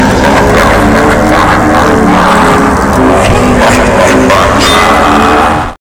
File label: laugh; g-man